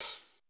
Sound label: HH HEAVEY, SY99; Crypton - DrumBase5000